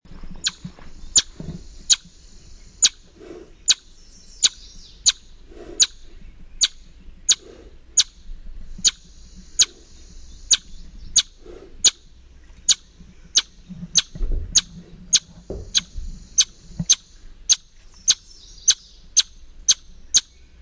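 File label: Eastern Chipmunk chip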